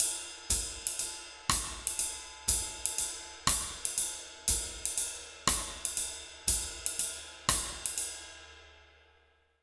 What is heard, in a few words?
120 BBAND, Jazz Latin Drumloops; USB Soundscan vol.08; AKAI